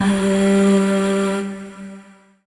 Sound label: Void Shawzin Oct One G; Warframe; Zariman